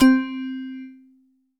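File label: bell C3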